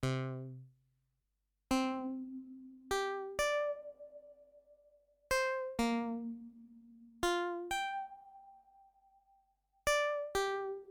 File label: ambient